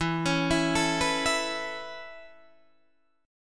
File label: A Major Add 9th E Guitar Standard, chord; Aadd9/E arpeggiated; JGuitar